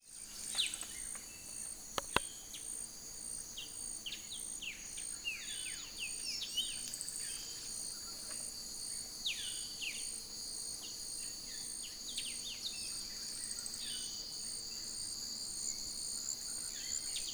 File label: Red headed malimbe